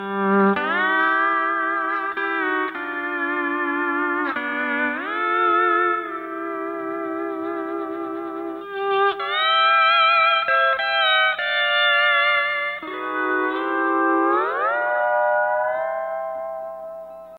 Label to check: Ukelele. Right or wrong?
Right